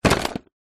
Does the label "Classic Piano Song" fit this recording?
No